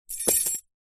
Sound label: household keys bunch drop on carpet; zapsplat